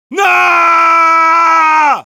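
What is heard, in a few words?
pain crticial death, Spanish; Grito de muerte; Heavy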